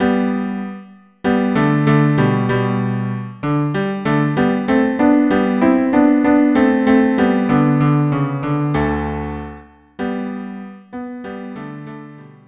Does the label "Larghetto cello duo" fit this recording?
Yes